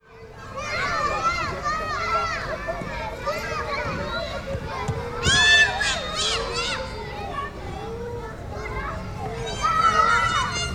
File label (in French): ambiance maternelle